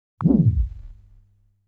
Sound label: Sound of Dragon Rolling Body